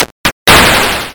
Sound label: base slammed; moondef